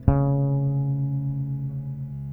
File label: PLUCKY BASS, Electric Basses; PlatinumPhattCD2; E-MU Producer Series Vol. 8 – Platinum Phatt